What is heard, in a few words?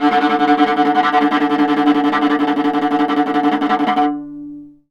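viola tremolo D3; Orchestral; DirectWave; Varazdin Orchestral; Viola II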